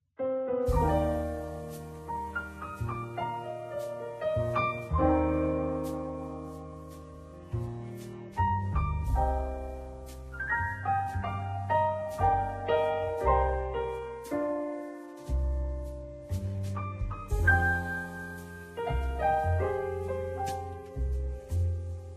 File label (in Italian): loop romantico soft